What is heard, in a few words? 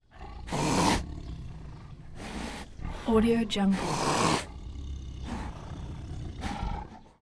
tiger hiss